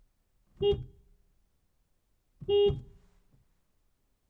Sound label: Beeping Car Horn From Inside Car Engine Off